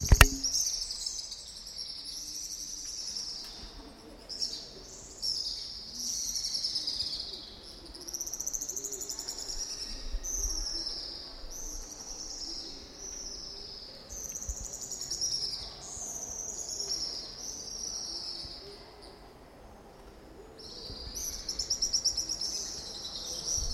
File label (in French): Chine dans la grotte des hirondelles